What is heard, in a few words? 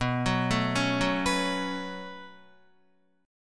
B 7th Suspended 2nd, down, Guitar; B7sus2; arpeggiated; JGuitar